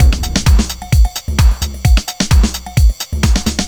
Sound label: Drum Loops, 130 BPM; Underground UK House & Electro